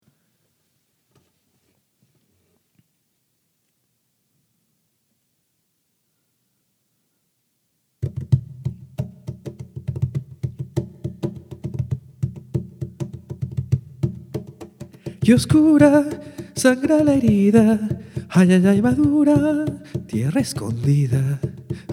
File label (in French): voix et percussion